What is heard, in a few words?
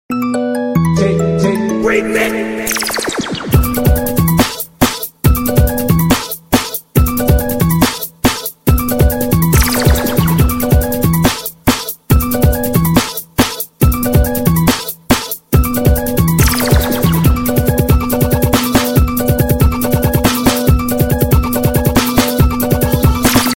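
nokia tone ringtone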